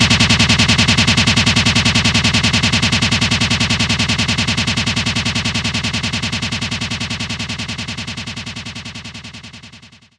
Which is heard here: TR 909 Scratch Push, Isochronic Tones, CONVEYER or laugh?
TR 909 Scratch Push